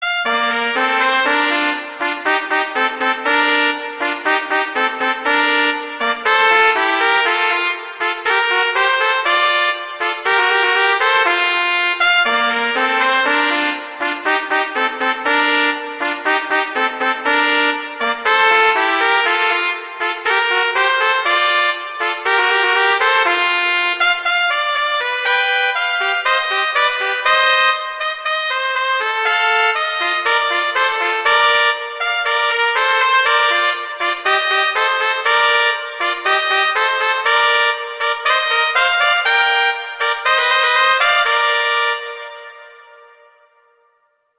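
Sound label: Duet, violin, viola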